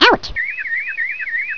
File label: ouch; PowerPete Demo